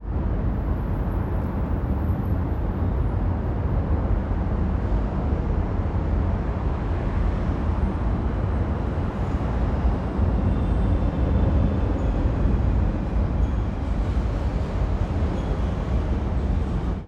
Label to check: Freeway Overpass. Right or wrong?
Right